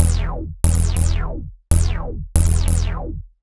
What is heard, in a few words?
ELECTRO BASS 140 A